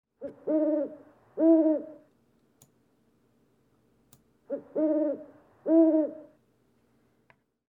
부엉이

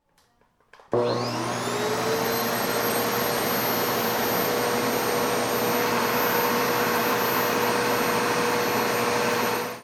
청소기

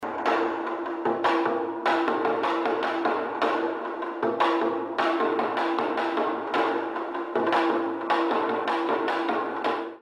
장구

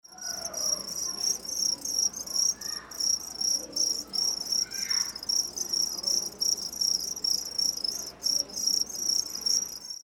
귀뚜라미

